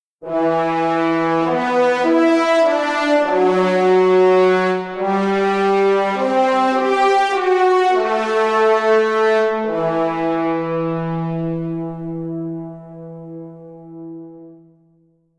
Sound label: horns